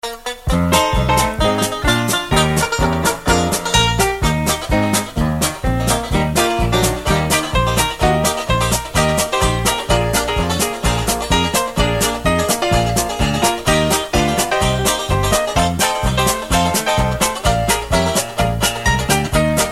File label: music